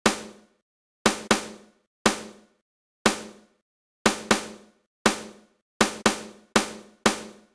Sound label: snare maple; hydrogen